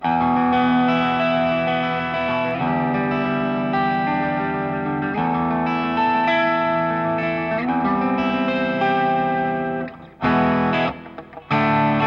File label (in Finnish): talla ja keski; strat plus